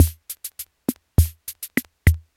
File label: latin, rhythm; Omnichord